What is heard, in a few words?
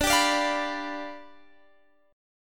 G 7th D